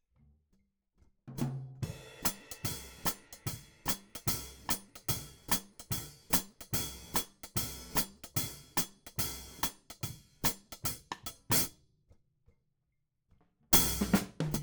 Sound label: Hat